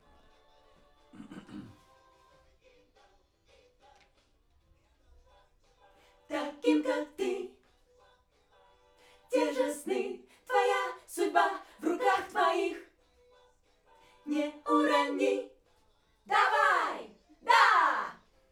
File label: Backs AKG